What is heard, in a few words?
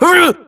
grunt death neck; Titanfall 2